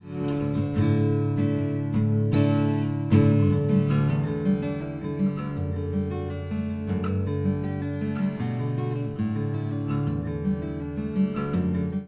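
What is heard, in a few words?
Guitar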